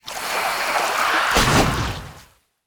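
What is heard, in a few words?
snake boss attack, monster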